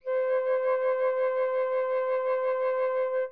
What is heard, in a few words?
flute C5 robot